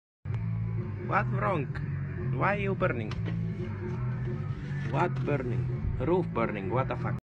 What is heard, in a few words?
roof burning